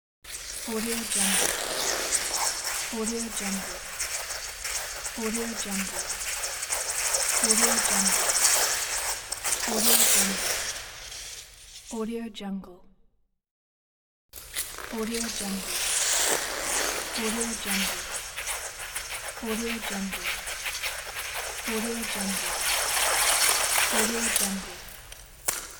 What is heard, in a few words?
ice skating sound fx pack